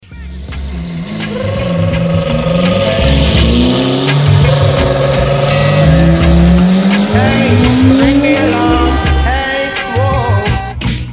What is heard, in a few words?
ring the alarm